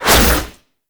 Fist Claw Whoosh; Incarnon

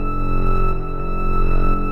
Hologram Energy Loop; Props; Loops; Warframe; Venus Open World; Venus Landscape

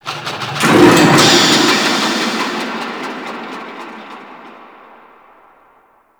Shipyards Dist Mach One Off; Ambience; Warframe; Grineer Shipyards

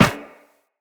Run Metal Perforated, footsteps; Characters; Operator

